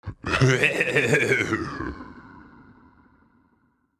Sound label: Float Away; Going Under